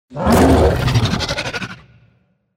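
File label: dinosaur roar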